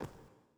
footstep

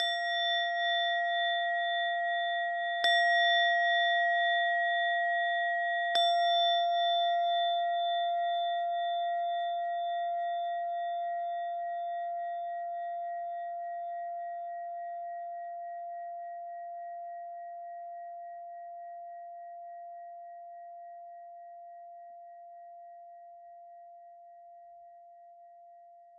bell

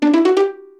jingle

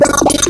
hardsync noise, pocket calculator; pocket calculator 24

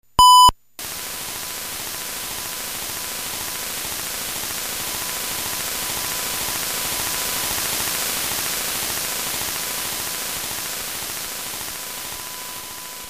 noise, apu mixer recordings; nes; sabicom

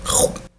chomp; snake

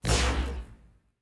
Sci Fi Blaster

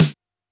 Snare, 707

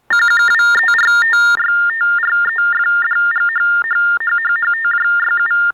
TTY

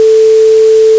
tone white noise

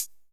hihat closed

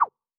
menu Cancel

